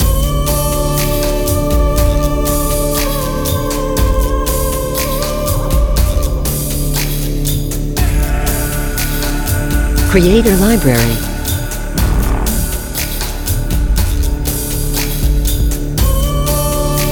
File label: Mystic Orient, World Beat